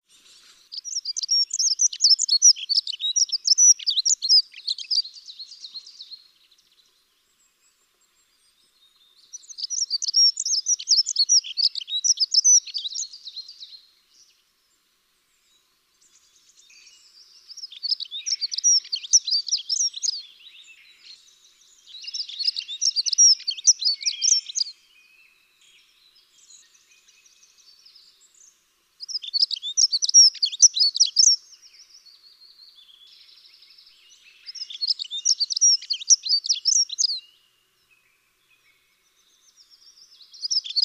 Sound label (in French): Chant du Accenteur Mouchet